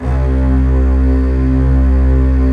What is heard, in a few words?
strings C1